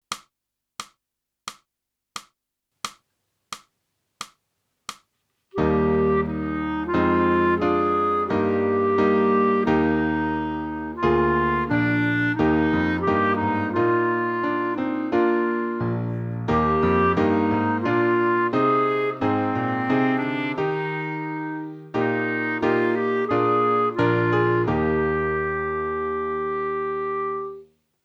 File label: clarinet; boosey method